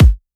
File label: Kick A